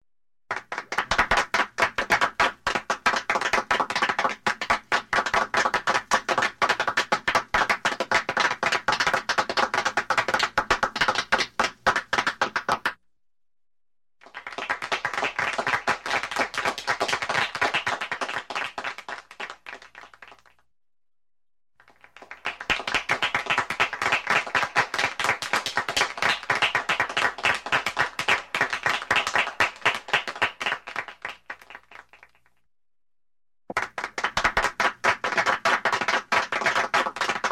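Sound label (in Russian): звуки аплодисментов сборка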